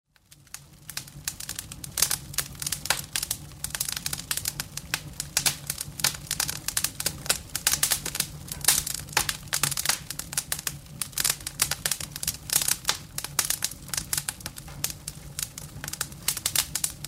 Firewood burning in the fireplace

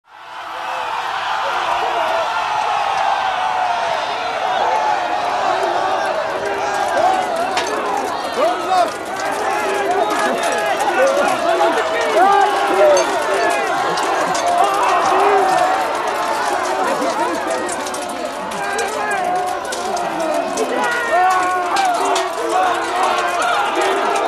Big crowd sword fighting, people screaming; cold weapons

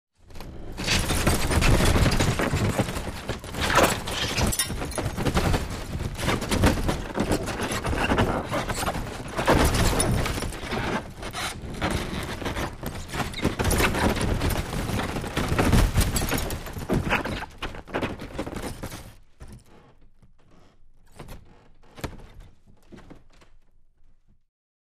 Breaking furniture, wood, blows, chips fly

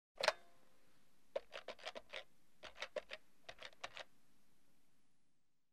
Pick up the phone, dial the number; telephone

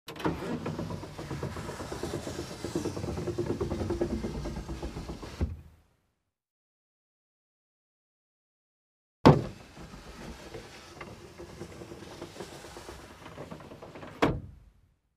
Car glass lowered, raised manually

